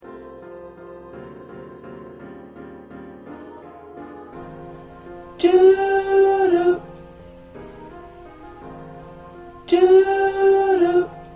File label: Alto